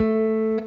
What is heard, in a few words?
Guitar & Bass; Quantum Leap